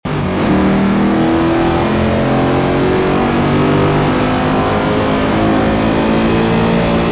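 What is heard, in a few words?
third, car engine; crownvic; vehicles; tdmcars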